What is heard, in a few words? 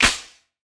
hand clap f sharp key; percussion; single hits one shots